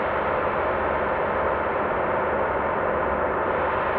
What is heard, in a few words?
sparse soundscape samples; ReLooped